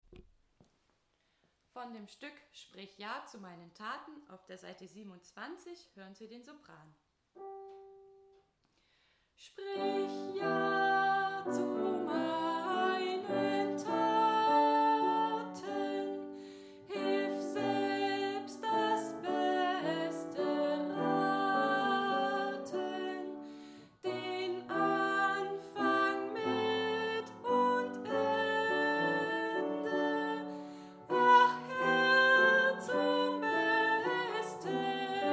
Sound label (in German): Sprich ja Sopran